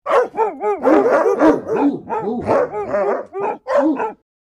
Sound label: Angry dogs aggressive barking and growling